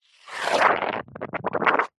foley liquid mostly foam froth bubbles suck in squelch